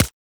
Weapon Punch Pistol; Warframe; Weapons

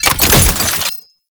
Shield Drone Start; Enemies; Abilities; Warframe; Tau; Dax Medic

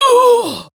Pain Female; Warframe; Tau; Prime Grineer Female; Battle

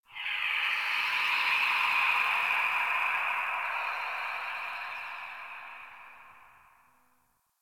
Space One Off, Ambience Exterior; Warframe; Tenno; Sector Wars

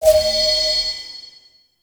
Courier Pickup; Warframe; Duviri; Courier Delivery

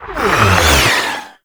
Excavation Scanner Deploy; Warframe; Missions

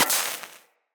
Laser Impact Metal; Weapons; Bullet Impacts; Surface

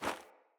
Sandals Run Snow, Footsteps

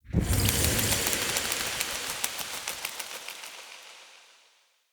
Bow Butterfly Dissolve; Weapons; Player; Bows; Fey Thorn Bow

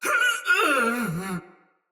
Death Soldier Mace Agent Female

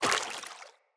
Grunt Step Water, footsteps; Enemy; Characters; Corrupted Sproutfolk

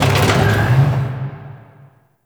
Clunky Machine; Ambience; Warframe; Corpus Railjack

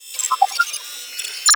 Computers Random; Warframe; Grineer; Computer Room

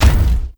Step; Warframe; Corpus; Jackal Redux; Steps

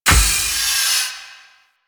Shell Pillar Door Close; Warframe; Corpus; Railjack; Comet Shredder

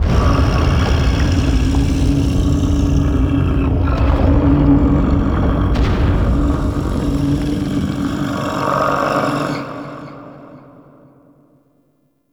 Shaking Lab Rumble; Ambience; Gameplay; Warframe; Entrati

